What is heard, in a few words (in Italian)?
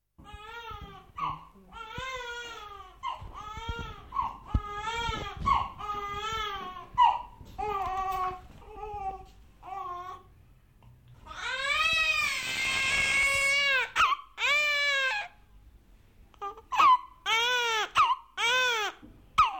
VAGITO NEONATO